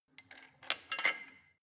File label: weight bolt insert, fitnessstudio; sport freizeit